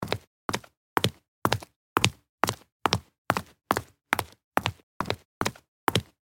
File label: High heels fast walking on street